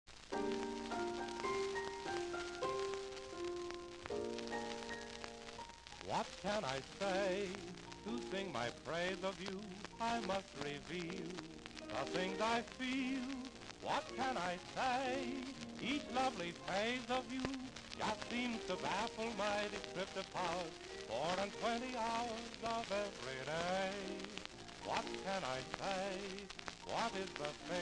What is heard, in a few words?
Orchestra, vocal; Clarion; Popular Jazz Archive 1920-1936